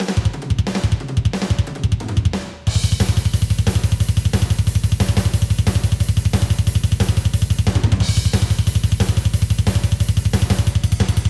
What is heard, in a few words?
just drums